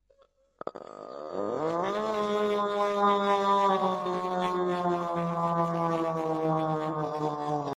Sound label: moan; Cursed plankton